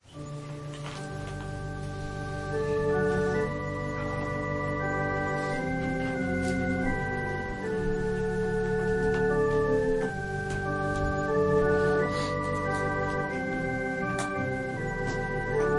Organ prelude

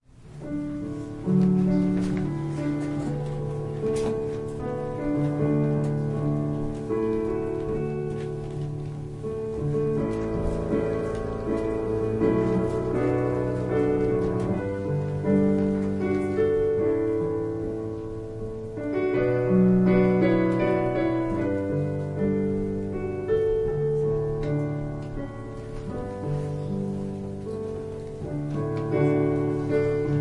Piano prelude, Opening

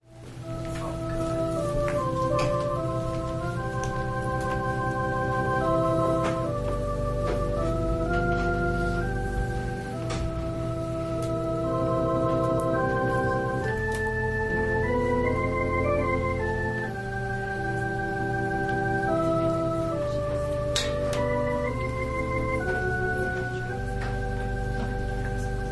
Organ prelude

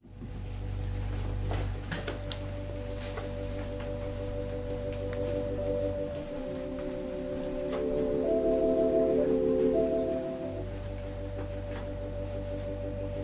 Organ postlude

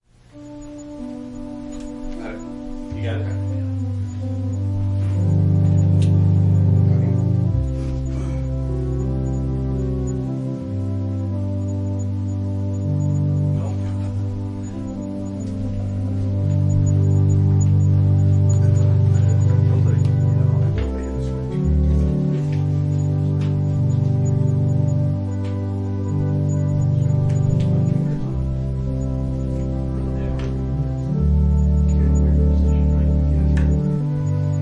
Organ prelude

